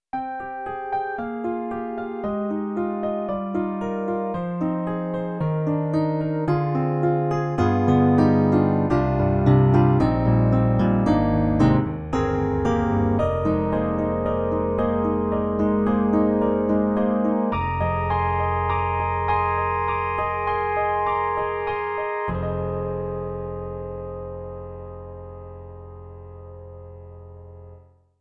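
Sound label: Roland SonicCell Blend Piano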